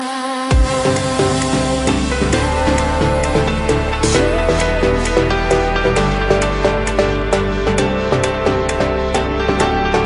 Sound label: piano trance